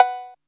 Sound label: ANA COWBELL; Back In Time Records - Big Bang